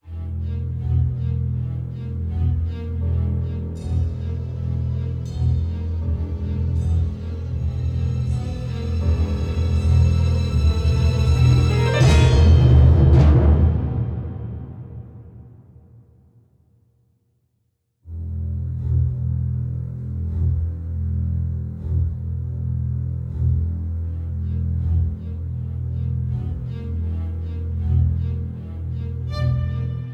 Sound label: High Tension; Katawa Shoujo; katawa-shoujo-re-engineered